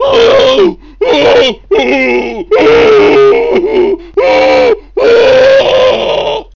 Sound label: Fire death; Cruelty Squad